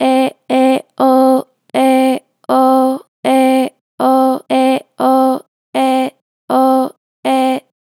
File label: Vib Eh Oh, Vocals, Natural Vibrato Gentle; Harmor Vocals